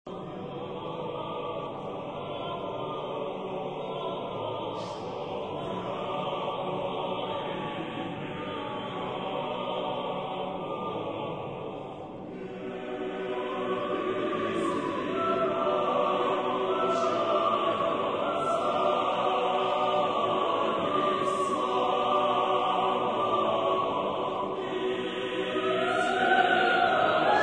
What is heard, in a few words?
Schnittke Choir